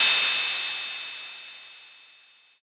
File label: crash cymbal